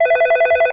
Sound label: phone ring